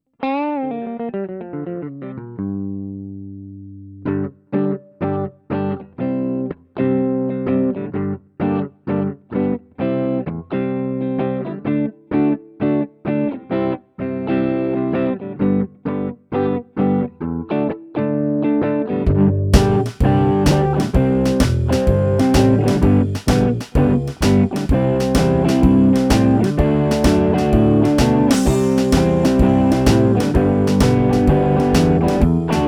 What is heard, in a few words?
Blues